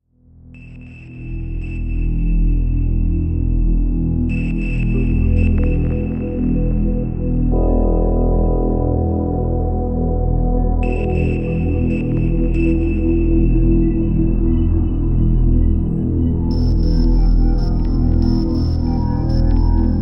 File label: Northwest Drone II